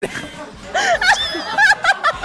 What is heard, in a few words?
Laughing; Barb